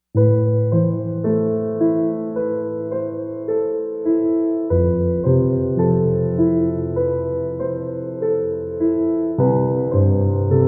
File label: Soft Scoring Grand